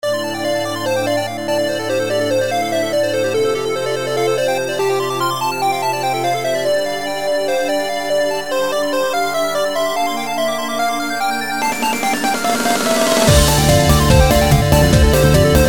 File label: square rave; Square Rave (WIP); Focused on improving on my bass rhythms and composition.